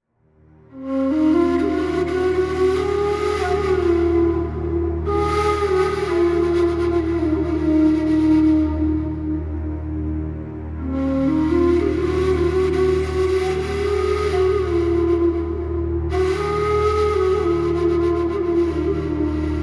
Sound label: Ambient Eastern Flute Solo